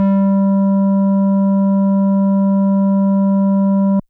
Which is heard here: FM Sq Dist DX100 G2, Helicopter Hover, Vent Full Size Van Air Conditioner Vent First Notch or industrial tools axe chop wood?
FM Sq Dist DX100 G2